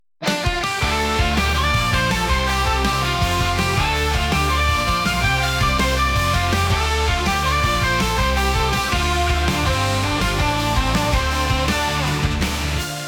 Japanese Anime Rock

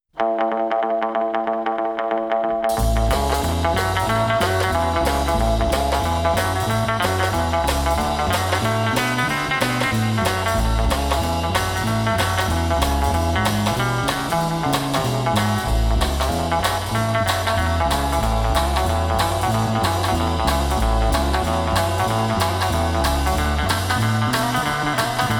Guitar Boogie

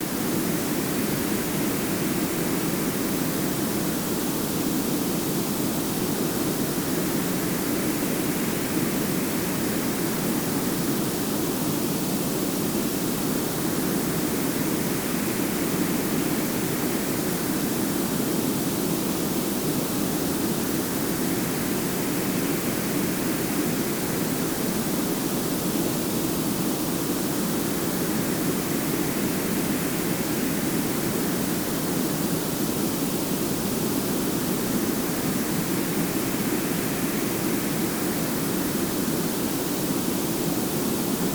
Quite White Noise

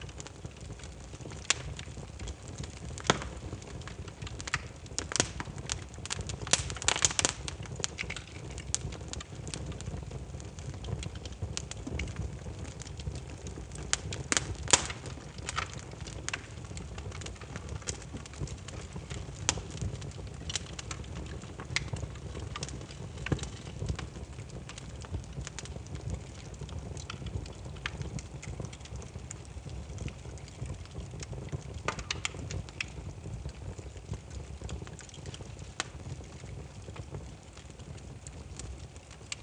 Gentle Campfire

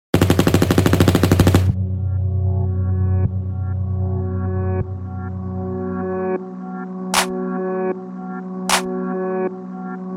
BASS BOOSTED

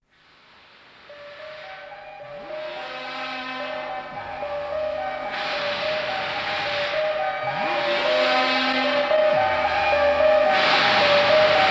Rimarimba, California Saxes

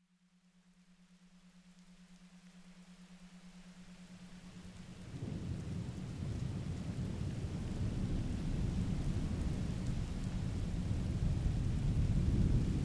Approaching Thunderstorm, Binaural Beats; Nature Sounds Sleep Track

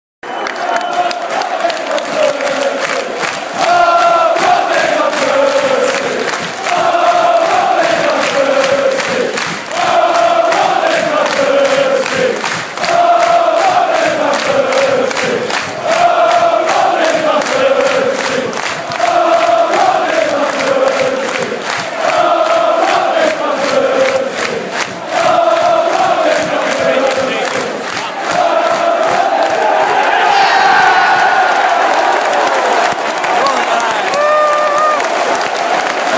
Chant Ooooh; Robin van Persie

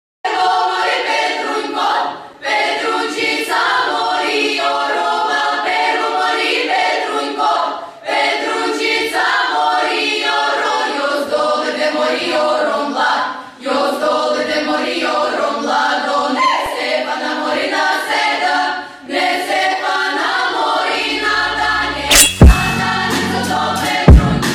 kick

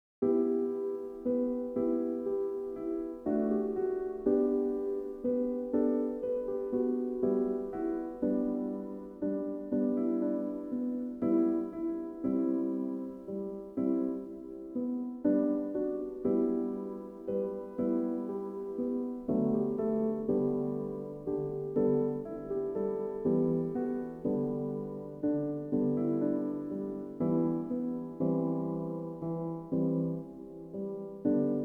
Piano; Easy Listening

